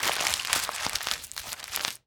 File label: paper crumple